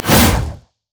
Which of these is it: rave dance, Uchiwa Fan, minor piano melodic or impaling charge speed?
impaling charge speed